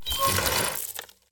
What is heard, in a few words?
arachne spider place; smite